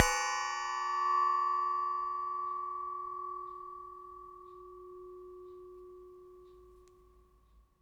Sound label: Metal Teapot Stand Strike